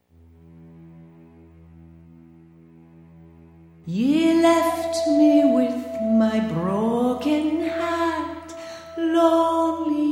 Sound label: Opening Vocal; Banshee